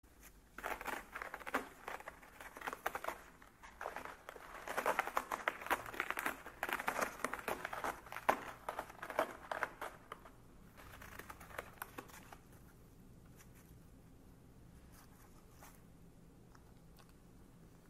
tieng meo cao roc rec

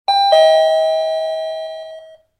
Tieng bam chuong can ho ding dong

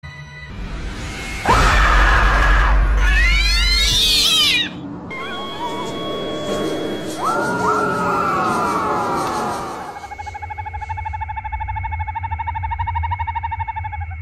Tieng ma keu

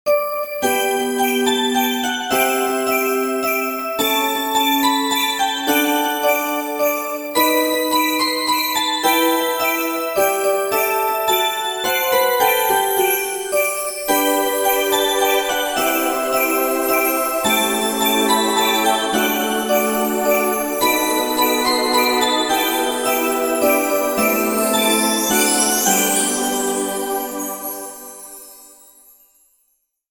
Nhạc chuông giáng sinh không lời

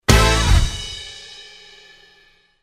tieng trong joke

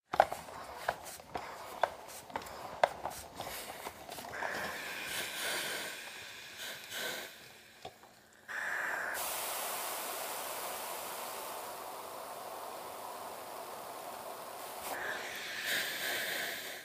tiếng bàn là bàn ủi quần áo

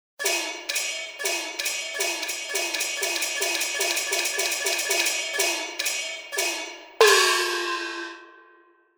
nhac ca kich san khau trung quoc

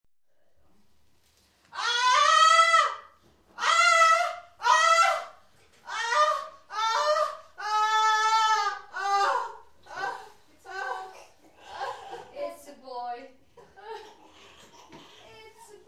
Tieng phu nu keu khi dau de chuyen da

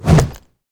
tiếng ném cây rìu

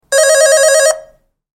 chuong cua can ho reo reng reng

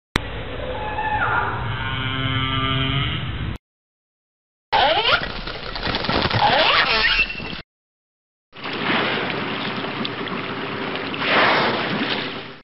Tieng con ca voi keu

